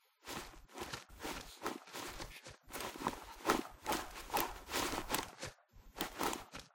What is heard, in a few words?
Emote Shadowboxing Foley; Athena